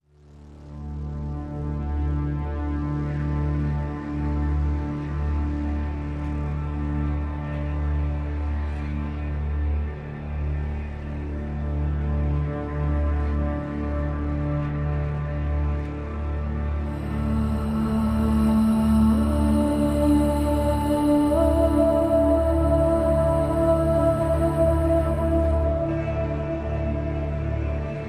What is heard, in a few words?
No Guitar